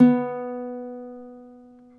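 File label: reference pitch uke bari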